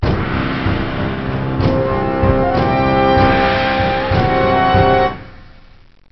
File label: Attack Theme, Cheesy Lo-Fi Sound Effects